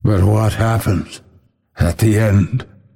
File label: death; dota; phantom assassin